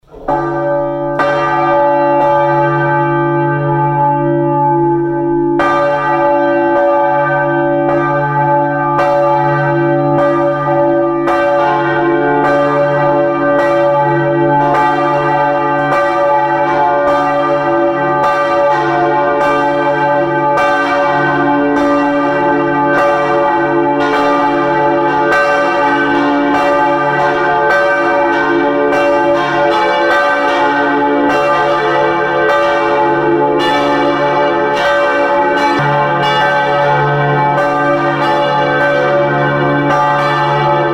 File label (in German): Glocken, Kirche; Peter-Paul-Kirche Reichenbach